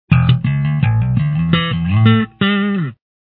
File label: bass distortion